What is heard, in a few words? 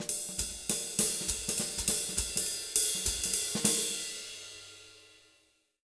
Jazz Latin Drumloops; USB Soundscan vol.08; AKAI